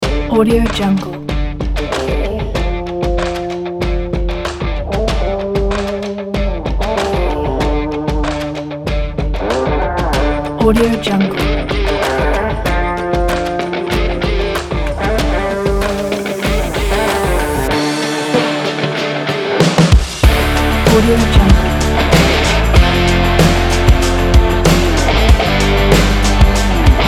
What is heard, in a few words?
stylish victory sport rock